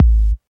hot bass drum sample g sharp key, steel kick drum tuned to the G# note; kick drum samples